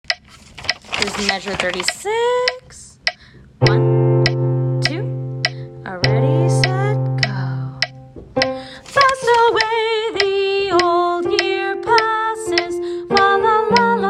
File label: alto